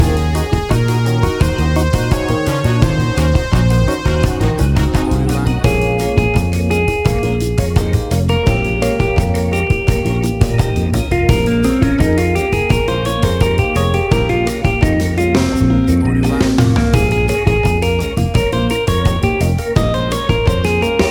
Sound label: Acid Jazz Latin Fusion Music; 30 SEC